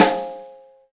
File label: SD, Amb Rm Kit, Akai MPC-500